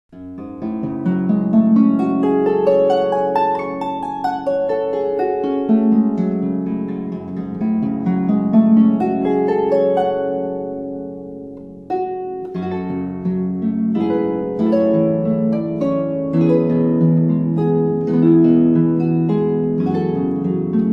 What Child Is This, Christmas carols on the harp

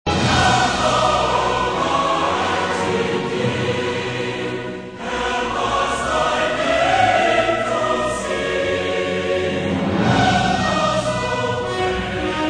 Orchestra and Choir, Hymns

